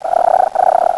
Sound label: GOOD FROG; PUZMANIA